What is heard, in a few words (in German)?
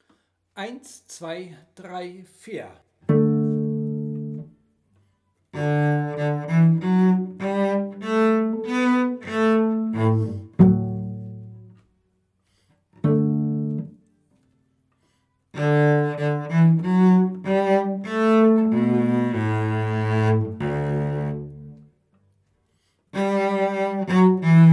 Stimme D; Reimlingen; Respighi